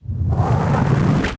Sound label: Slomo End; characters